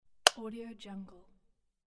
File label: switch; soundfoodsfx